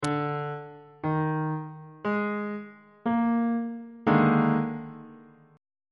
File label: d sharp major 7th suspended 4th 3rd inversion